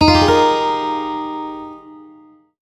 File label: Folder Reminder; Sony Ericsson; PlusFourSix; Venus (Xperia X1); Lars Edvall; Shine